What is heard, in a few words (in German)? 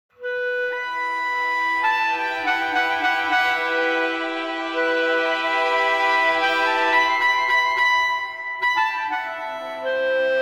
Solo Klarinette